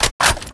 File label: psg1 mag in